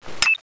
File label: claymore trigger on